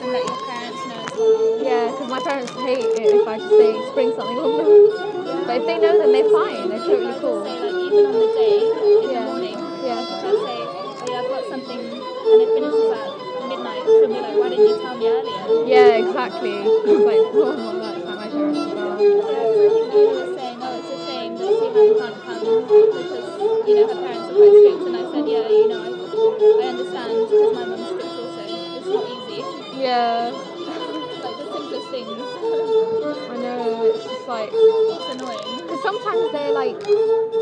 Demo of instrument from Cyprus (with background conversation)